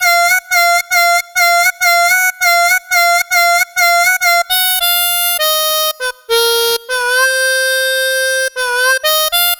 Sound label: Blues Harp, funk; brass сэмпл в стиле funk